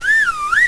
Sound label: npc hvizd; Undercroft